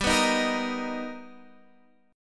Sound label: B Augmented Major 7th G, chord